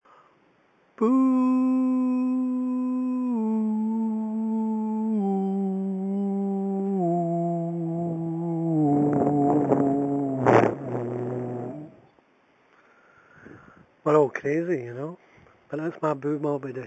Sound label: makin music